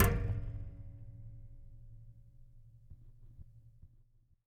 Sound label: Colide